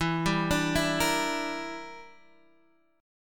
E Minor 7th Flat 5th E, Guitar Half Step Down

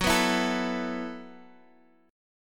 A Suspended 2nd F sharp, chord; Asus2/F# strummed

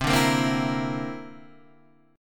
D 7th Suspended 2nd Sharp 5th C

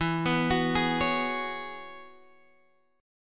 E 6th Add 9th E down Guitar Open G

